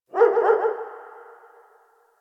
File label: Distant Ambient Dog Bark; Ambience; Warframe; Nineteen Ninety Nine